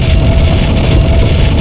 engine

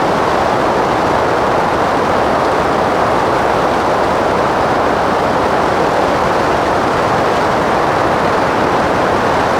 ambience wind rush

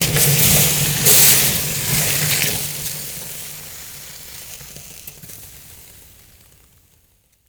hiss

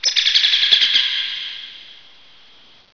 birds

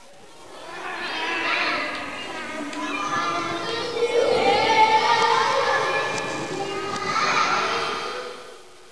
strange children

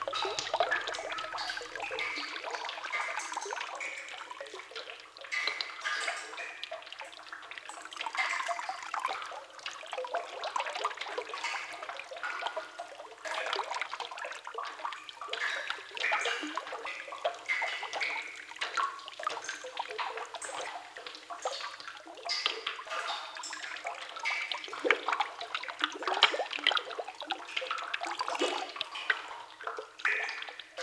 ambience water soft flow

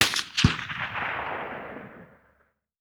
soundscape gun far

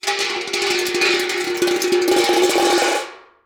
metal clang

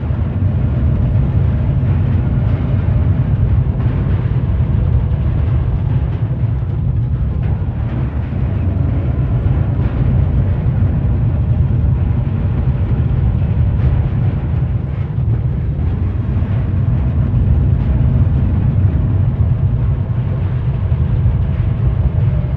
ambience disaster rumble

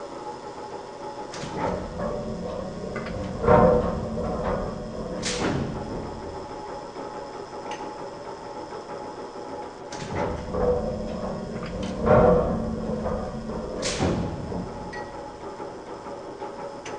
ambience industrial

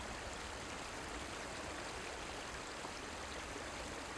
loop water

